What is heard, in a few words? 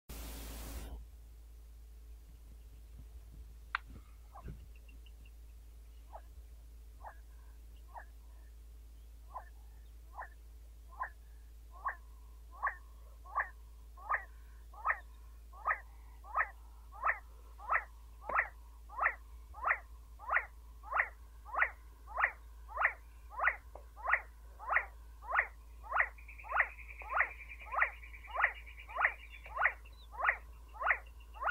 See See partridge sound